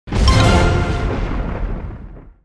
Big Win Appear; fire Wizard; Axial

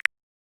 click; adelia The Fortune Wielder; Foxium

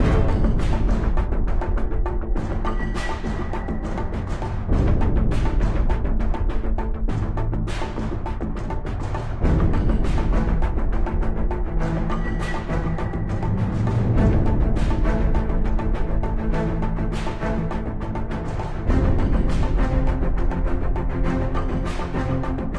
idle ambient music freespin; Recode; rampage riches Desktop

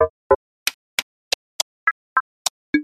Game Sounds Sprite Console; Romanov Riches

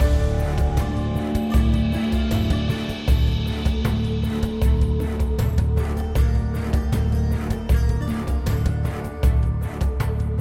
music main; hades River Of Souls; Fantasma

